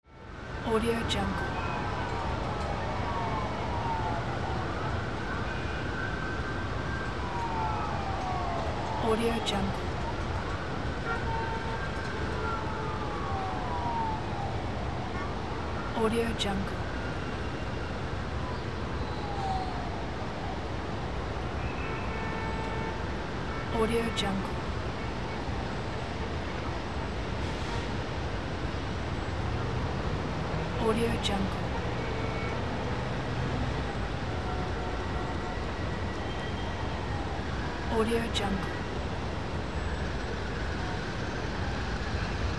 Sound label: city with sirens